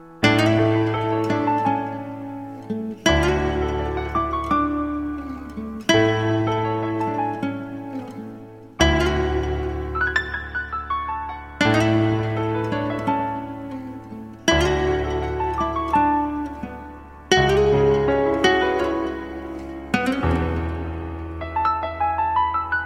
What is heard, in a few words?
lovely guitar